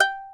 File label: G4 HASAPI; AKAI S6000 CD-ROM; WorldSounds